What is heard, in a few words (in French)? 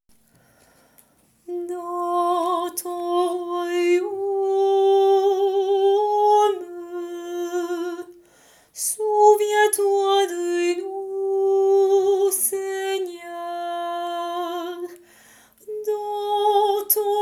Passion Dans ton Royaume